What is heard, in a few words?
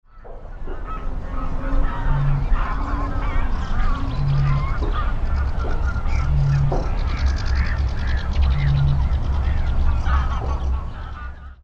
eurasian bittern